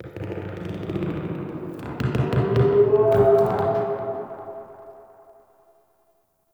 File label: Dark Creak; Ambience; Corrupted Ode